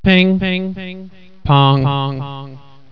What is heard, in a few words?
pingpong; Rosegarden